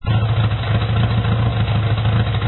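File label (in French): Midget ralenti, Midget 1275cc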